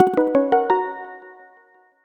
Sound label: get item